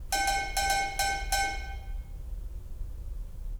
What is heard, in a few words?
cowbell